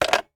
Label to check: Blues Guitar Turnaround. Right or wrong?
Wrong